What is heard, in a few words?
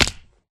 fall small, damage; Minecraft